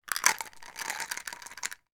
household vitamin tablets empty from container into hand